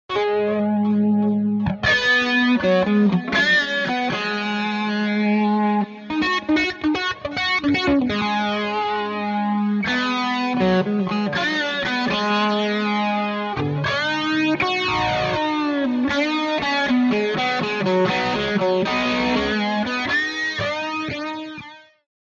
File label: lightweight chorus guitar